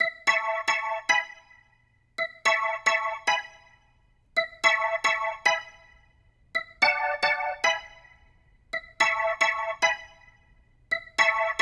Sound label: Rotor Riff, Organ